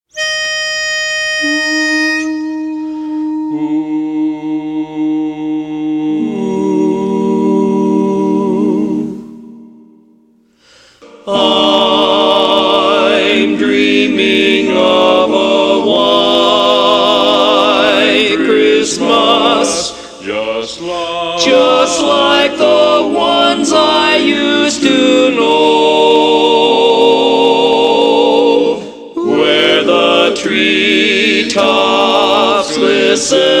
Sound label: 4 part; Christmas